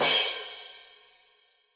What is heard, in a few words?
Crash Cymbal